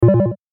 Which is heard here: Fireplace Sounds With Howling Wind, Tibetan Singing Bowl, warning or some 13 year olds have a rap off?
warning